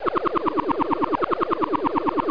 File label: Flying Saucer